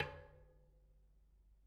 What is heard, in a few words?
Bicycle Spoke